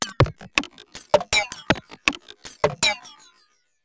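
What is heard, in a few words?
percussion, ambient, 160; eJay; Drum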